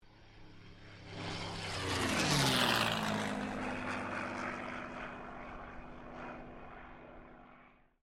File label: AIRCRAFT CA-18 MUSTANG PASS